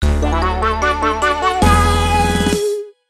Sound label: Bike Race Start; Yokai Watch 3